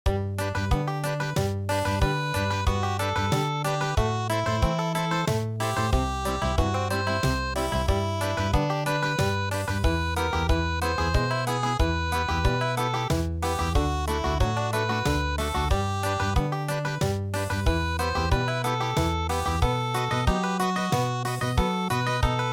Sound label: Country, midi